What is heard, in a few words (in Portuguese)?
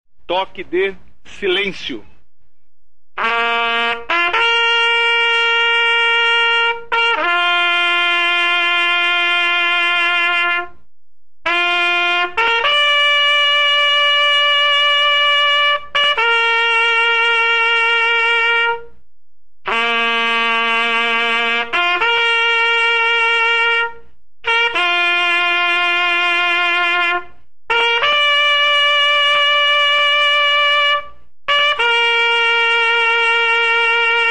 silêncio, toques de corneta